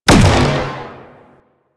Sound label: Laser Disc Fire; Warframe; Venus; Venus Bipeds; Venus Laser Disc Moa